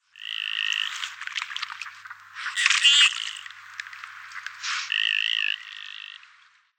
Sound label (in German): Schellente Bucephala clangula; Vogelstimmen